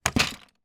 toy mega block structure throw in box of loose blocks; zapsplat